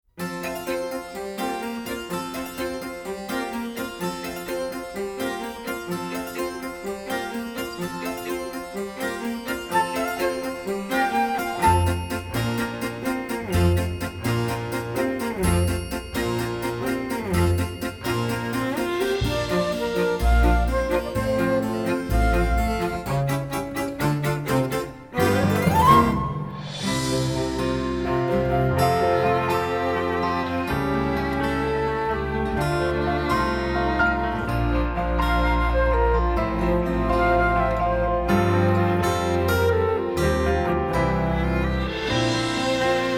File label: Instrumental; Audition Cut